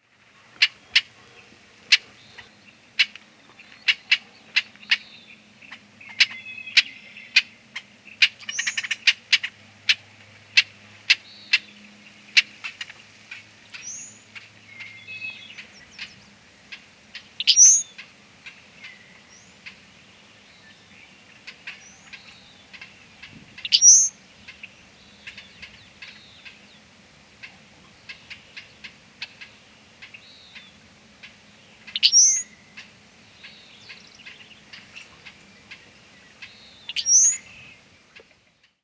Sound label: Brewers Blackbird OR Song and check calls